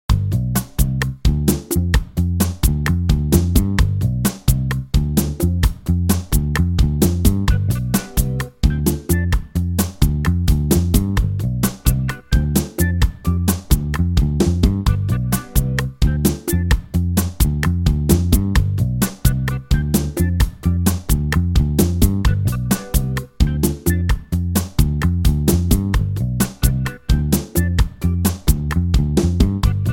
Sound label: BMP130 G